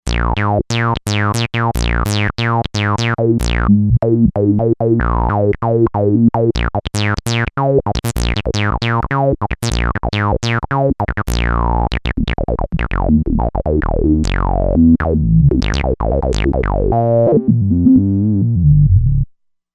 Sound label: Bassline